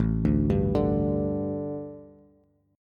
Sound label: A 7th Suspended 4th, A, down, Bass